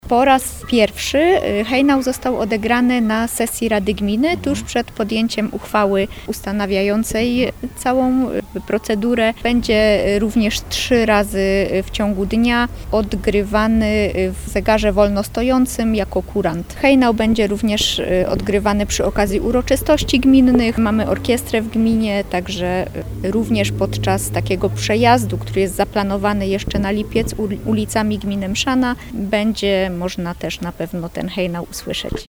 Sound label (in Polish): hejnal